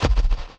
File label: Perc, drums; Touch Loops; BlissedOutBeats